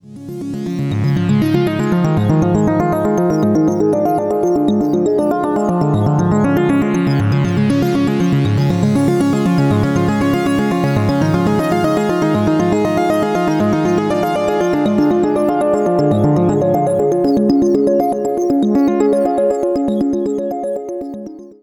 Arp Drops

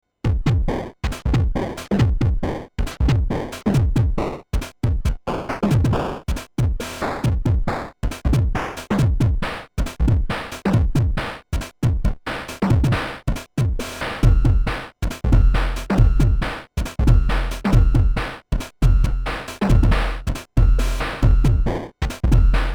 Twisted Electrons hapiNES L 8 Bit Amen Break

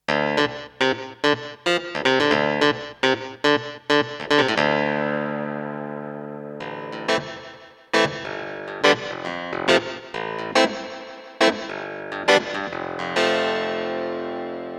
clav; ursa keysuite

